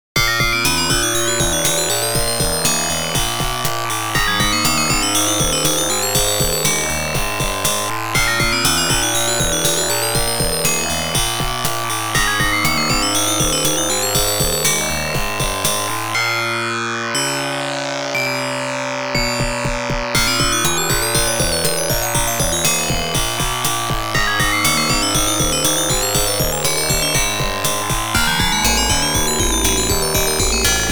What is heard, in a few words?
OPL2